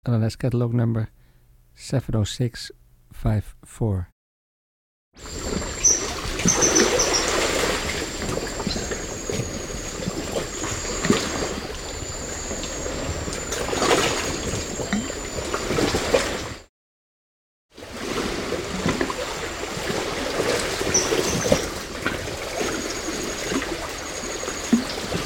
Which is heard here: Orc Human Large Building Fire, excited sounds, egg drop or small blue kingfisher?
small blue kingfisher